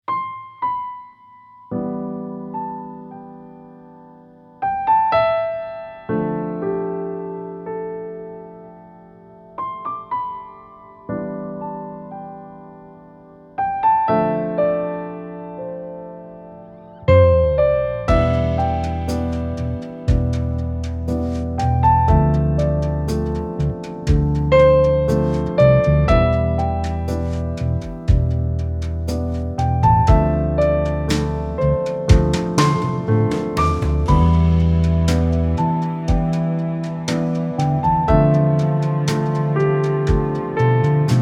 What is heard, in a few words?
Reflective Piano Ballad